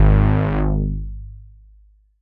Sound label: Release G#1, Analog Synths; Ableton Foundation FreePacks